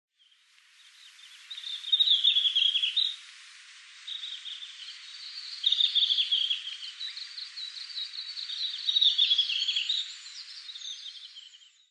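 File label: red-flanked bluetail, hayachine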